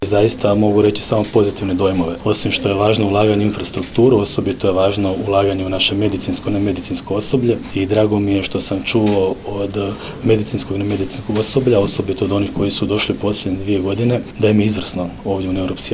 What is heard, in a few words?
POP